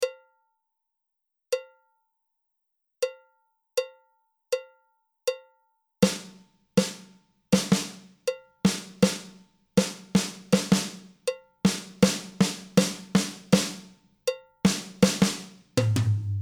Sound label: Click 80bpm